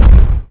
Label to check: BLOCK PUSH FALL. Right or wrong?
Right